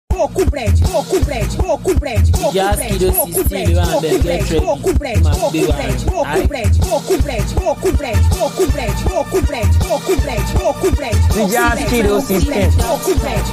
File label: Beat